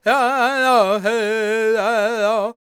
C# MIN, World Voices, LAKOTA

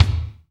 kick funk, ambient kick; Roland L-CDX-01; KIK Ambient K1

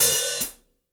Gretsch Hi-Hat Closed

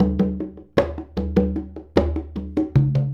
Congas; Essential Percussion

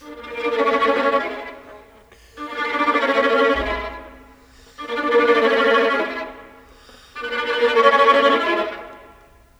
Viola, Cinematic; Sonic Foundry (Sony Creative Software); Orchestral Series VOL-3; Cinematic 16

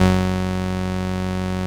505, String Machines

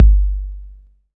BD hip, Dance Mega Drums; Best Service

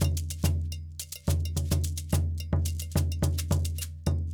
Djoun, World Percussion; Bell, Shaker

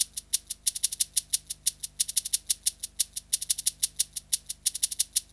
Shaker Loop; Sonic Foundry (Sony Creative Software) - Fluid Dynamics Drum & Bass; Construction Kit; Percussion

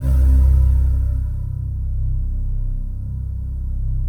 DIGI PAD; Best Service; XXL 800 Pads

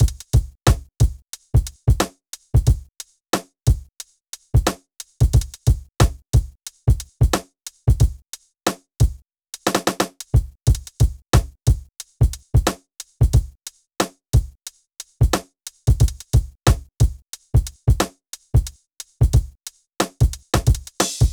Drums, Hip Hop

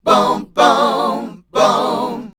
Voice Spectral, H PHRS; Best Service; Voice Spectral II

